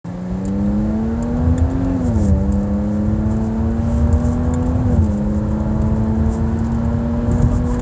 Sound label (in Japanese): ジャガーXE-Sのエンジン音